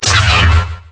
beam katana out, weapons

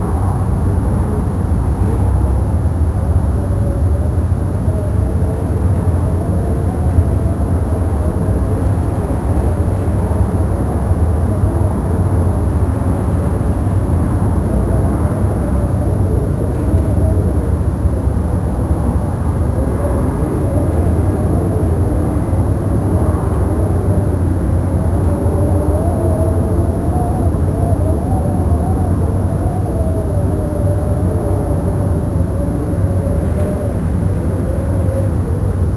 Storm indoor

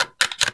ak clip insert

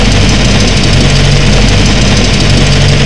rotor

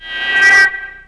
farsight reload

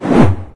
scanner near miss; scmod; synthcrab

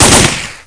pl gun double

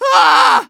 medic pain severe; tf2

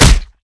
maglite smack

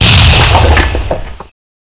meteor hit

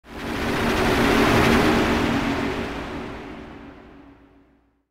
Alien effet

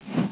eagle flap

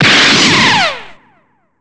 ki ball release

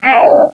alert

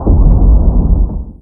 far explode

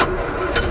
plane start

